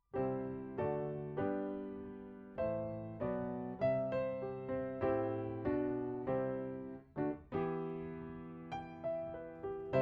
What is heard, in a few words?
Piano A